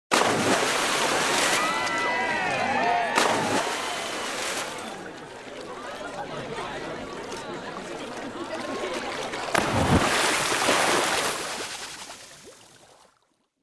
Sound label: Pool Splashes